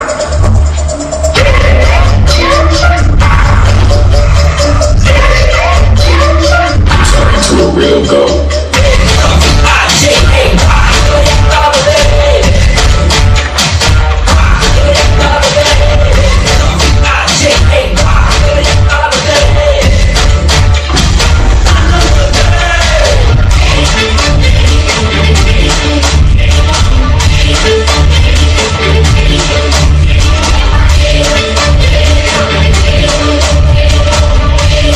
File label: Villain Bgm, Tamil; Goat